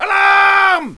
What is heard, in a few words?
program error; Medal of honour; MOHAA; Desktop Themes